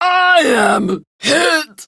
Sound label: Death Prime Grineer Female; Tau; Battle Barks